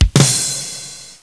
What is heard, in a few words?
rimshot